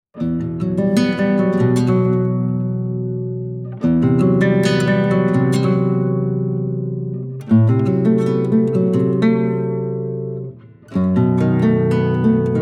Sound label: rumba